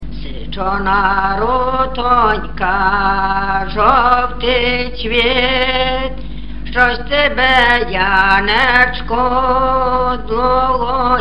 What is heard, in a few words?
Syczona rutonka zolty cwit; Orchowek